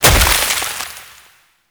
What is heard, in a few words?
Proc Cold End; Warframe; Mods